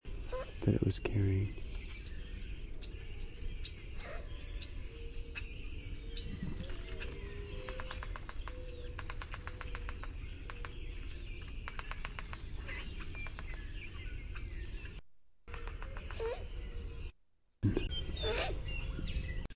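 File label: Great Horned Owl scream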